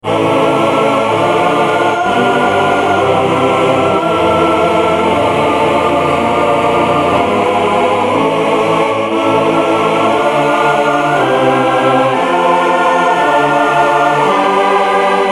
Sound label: russian choir